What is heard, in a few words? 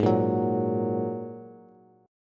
Ab 7th Suspended 2nd, down, Bass; Ab7sus2; strummed; chordshape; JGuitar